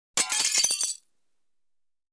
Destruction, Very Small Window; BBC Sound Effects Library

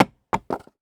Drop Cardboard Box On Thick Carpet; Dropping Things; The Sound Pack Tree

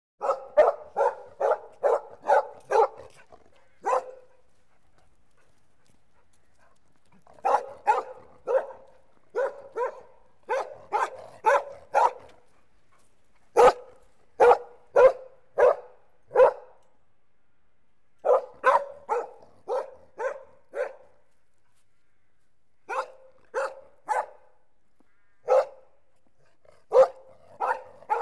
Collie Dog Barking; Animals and Birds; BBC Sound Effects Library

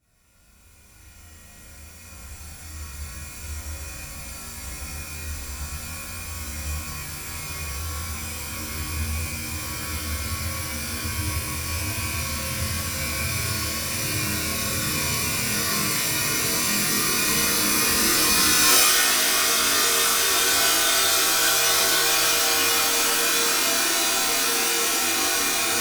Drone Atmosphere Tension; DRONES; MatiasMacSD; THE ZONE DRONES

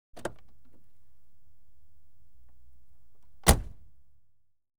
Car, Exterior, Door Opens And Shu; Auto; BBC Sound Effects Library

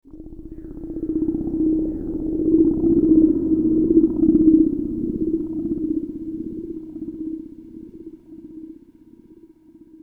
Aliens Monsters; FXHome

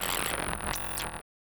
GLITCH SCI FI COMPUTER; MatiasMacSD

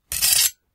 sword Slide; Foley; Kenney's Sound Pack

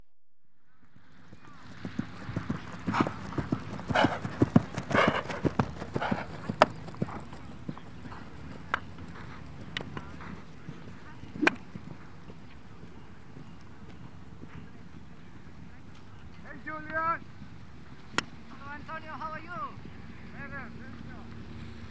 Polo, Warm Up Before Match Horse; Equestrian Events; BBC Sound Effects Library

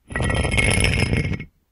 stone Drag; Kenney's Sound Pack; Foley Sounds; Rocks

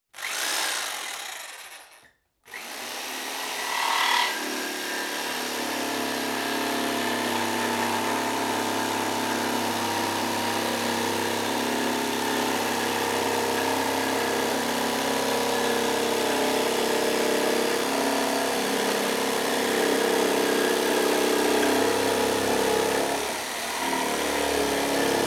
Electric Jigsaw, Plywood Sheet; Construction; BBC Sound Effects Library

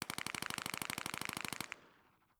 Full Auto 9mm Mag Dump Far; WatsonWu

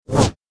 Double Bladed Saber Sound; FXHome

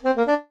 jingles saxophone; Kenney's Sound Pack; Music Jingles

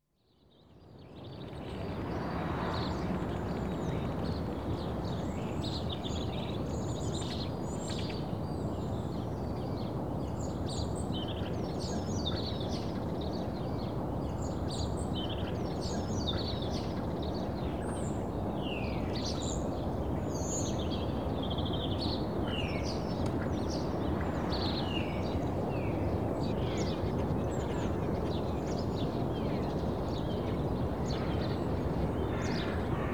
Early Morning Suburban Birds; Suburbia; BBC Sound Effects Library

